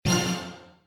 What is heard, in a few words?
prize hit; Burning Stars; wazdan